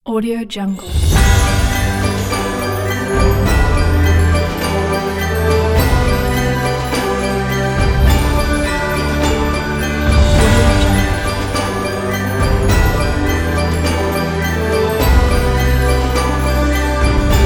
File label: christmas orchestra ident; zaharvips